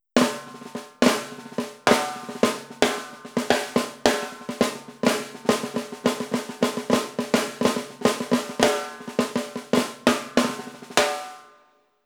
metal snare; Gretsch 14" "Crystal Tone" Snare

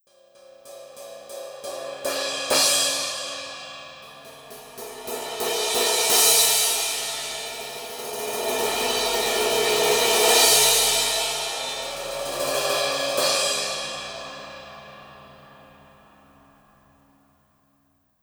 crashes

